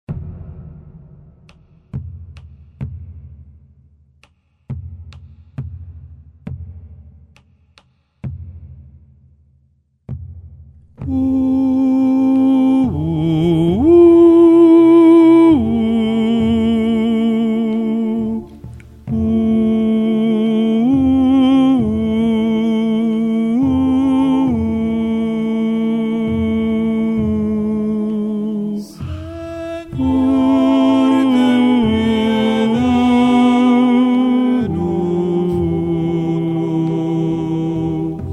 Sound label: Kyrie bas